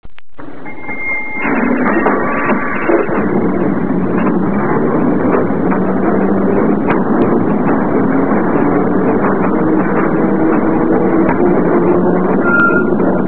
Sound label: cable car sounds; kaikorai